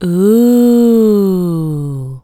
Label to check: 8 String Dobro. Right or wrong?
Wrong